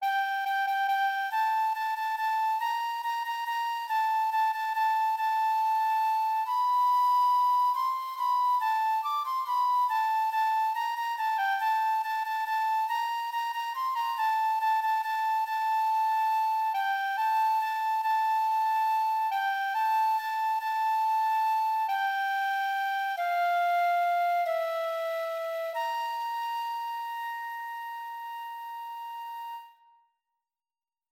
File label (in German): Floete, 140; Carol of the Bells; Floete 3